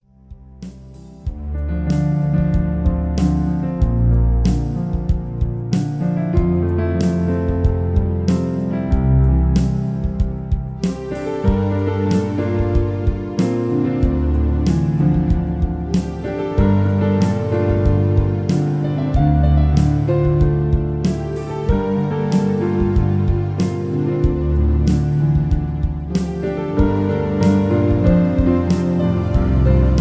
My Piano Version

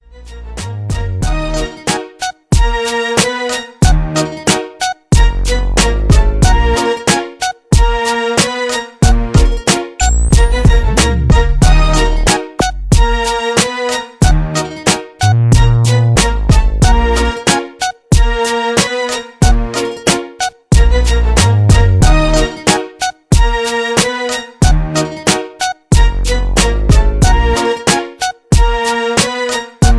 beatdown

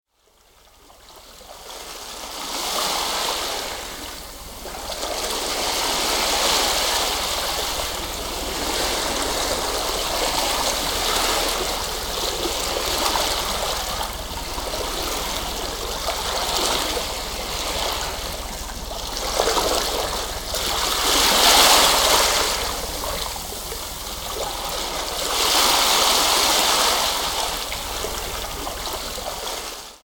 Delta Meditation

